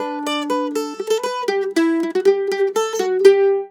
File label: MANDOL 120 G; USB Soundscan vol.42; Celtic Flavours; AKAI